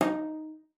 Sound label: CELLO FINGER D#4; Xsample VOL-5